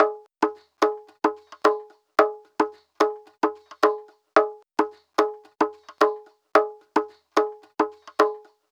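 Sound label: percussion, Roots Funk; USB Soundscan vol.41; AKAI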